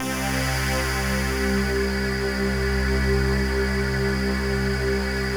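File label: pulse; Masterbits; Vintage Voltage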